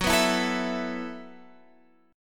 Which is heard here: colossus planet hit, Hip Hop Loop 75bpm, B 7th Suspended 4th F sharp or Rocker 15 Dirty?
B 7th Suspended 4th F sharp